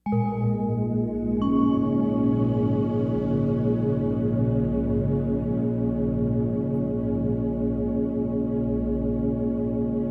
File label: meditation